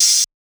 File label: hat window, Open Hat; Hip-Hop; Drum One Shots; SD CAICOS Hip-Hop Sample Pack; Slate